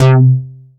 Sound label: PUNCHY BASS; Masterbits; Dance Trax